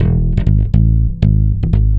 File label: Chapman Stick, Basses, Loops